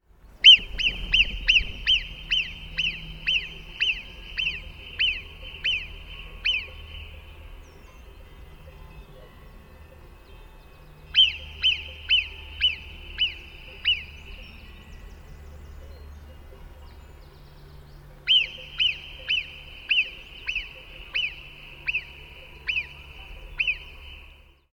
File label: Marmota marmota Warnrufe